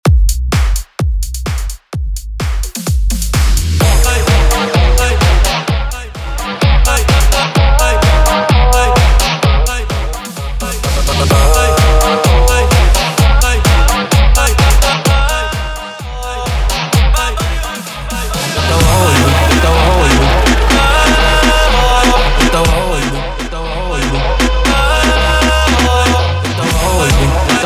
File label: Transition EDM To Reggaeton 128-102 BPM